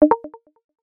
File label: alert asterisk